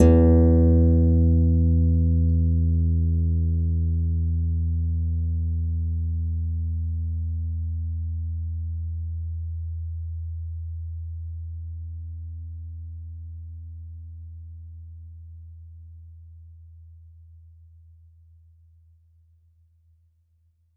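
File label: E1 pp squeak, harp; Garritan; GigaHarp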